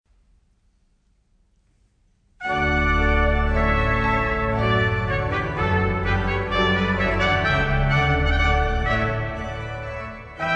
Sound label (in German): Hymne, Preis und lob, mannenkoor